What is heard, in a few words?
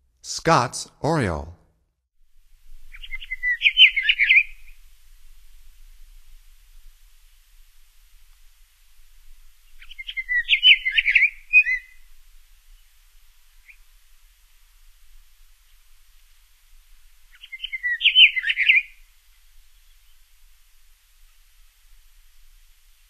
Scotts Oriole, Songs of Birds of North America; Stokes